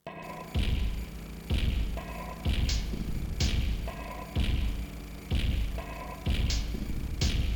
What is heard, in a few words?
Loop drums; Sophia